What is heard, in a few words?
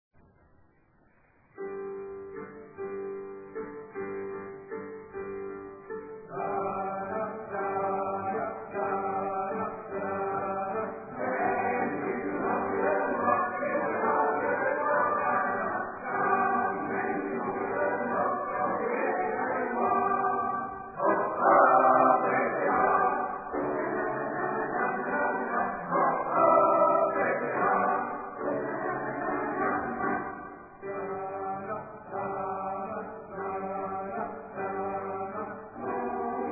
mazur